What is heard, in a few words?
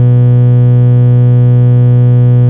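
ambience lite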